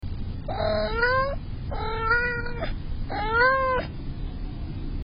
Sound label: mrrp mrrp meow mrrp meow